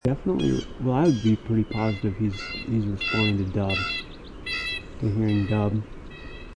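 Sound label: Blue Jay jeer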